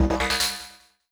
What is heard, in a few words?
black magic up magic circle appear